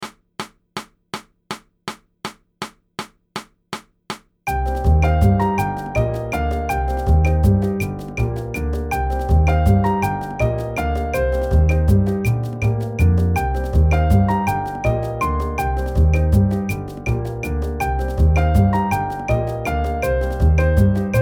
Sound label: ancient forest no click